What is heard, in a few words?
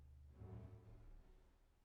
Bass